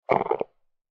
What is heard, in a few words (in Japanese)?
お腹のぎゅるぎゅる音